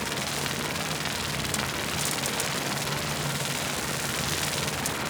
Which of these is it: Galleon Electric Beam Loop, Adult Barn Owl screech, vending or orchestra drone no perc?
Galleon Electric Beam Loop